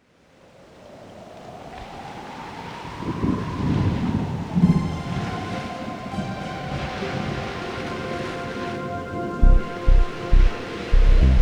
Rain & Surf; Worms Armageddon; Dreamcast

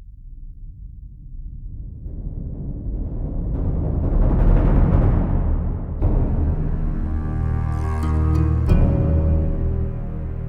Sheriff Shot

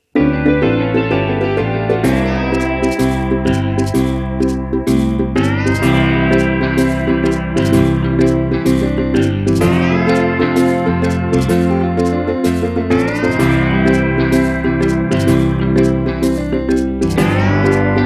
Dance Loop; Happiness Charge Precure! Kawa Run Collection

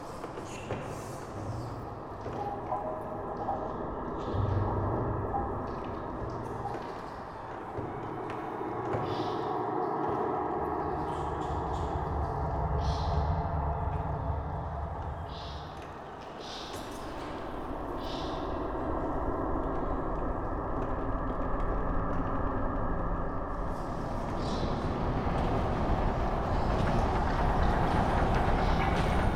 Amb Castle; Orcs Must Die

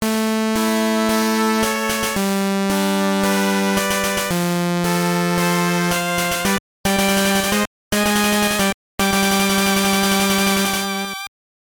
Unused Jingle, All Clear; Mega Man 5; Gameboy

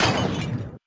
shield break; Glorius dead

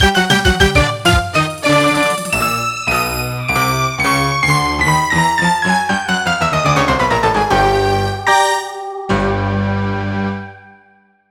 Win; Hauntedboss

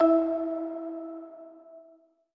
marimba; Sky Children of the Light gamerip

